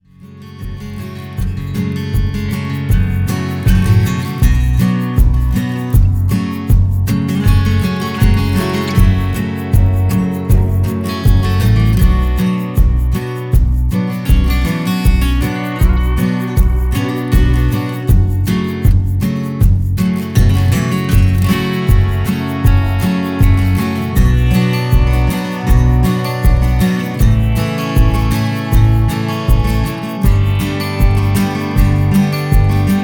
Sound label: Professional instrumental soundtrack